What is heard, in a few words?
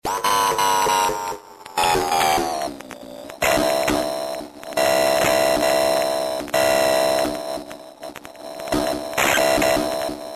loop; loopswitch